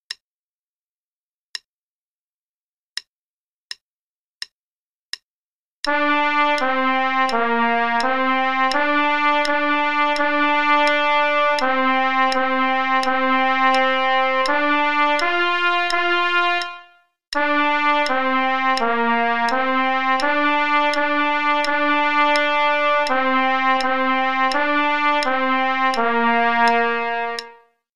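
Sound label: Play-a-long, quarter note = 84, trumpet; SOE Bk 1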